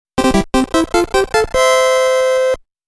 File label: Congratulations!